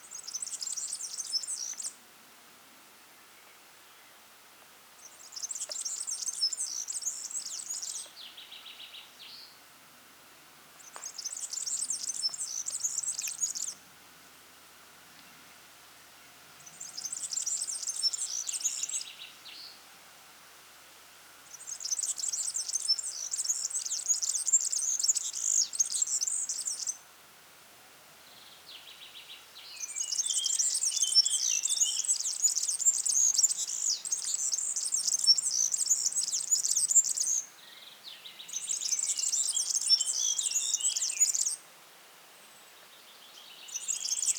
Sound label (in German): Vogelstimme Girlitz European Serin Serinus serinus; Vogelstimmen